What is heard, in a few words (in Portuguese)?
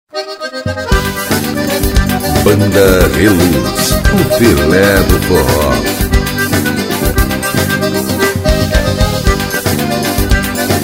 sanfona triangulo zabumba